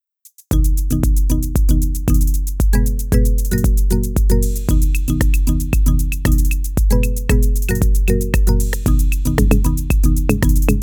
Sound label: xylophone fun